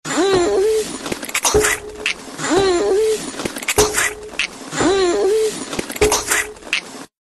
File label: wet mouth wild moans ringtone